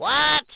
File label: Battery low; Innostream INNO 55